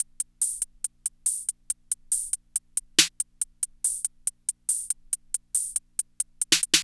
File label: Beat Box Hats; Electronic Point Blank